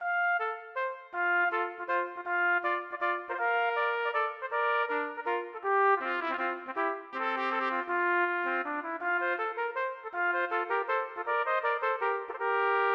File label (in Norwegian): Menuet for trompetduett; Aamodt